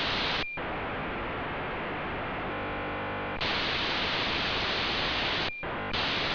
D-STAR 4800bps / (AMATEUR RADIO MODE, VHF/UHF ONLY)